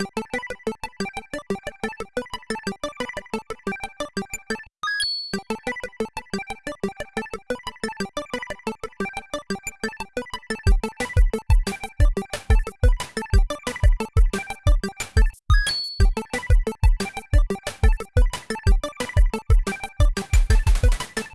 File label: ringtone; BAB OST; bakerygame